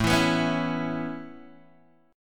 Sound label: A Major Add 9th A Guitar Open G, chord